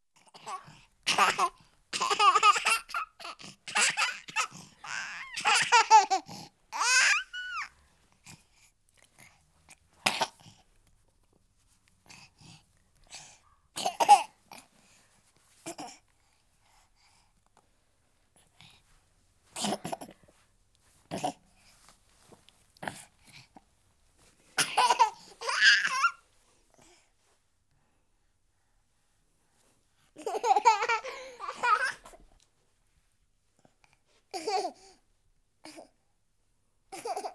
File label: Month Old Boy, Chesty Laughing, Babies; BBC Sound Effects Library